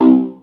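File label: BLIP, cyberpunk; Rubber Chicken Software Sample Bank; CYPERPUNK 2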